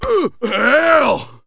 PAIN; REDNECK; RAMPAGER

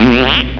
Rasbery; Kinga 2.0

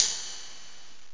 RIDE

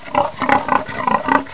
SNORT

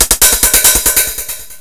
HIHAT LOP; BAS PERC; eXtended Instrument

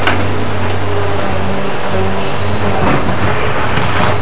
GRATER; Screen Wreckers

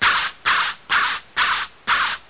bird; Quake; PainKeep

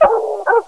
HOUND2; mtm2demo; PC Gamer 4.2

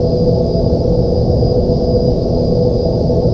engine; imp

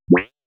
BLIP

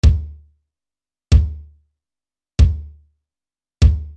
Kick-click

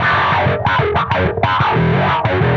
Fuzz Wah Bass; Sonic Foundry ACID Music

